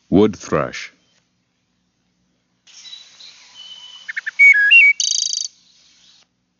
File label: wood thrush